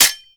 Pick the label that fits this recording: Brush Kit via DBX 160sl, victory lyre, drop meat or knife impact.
knife impact